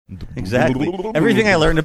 mimicking funky bass line

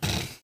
snorting

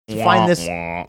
wah wah

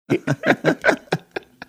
chuckling

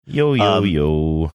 singing yo yo yo

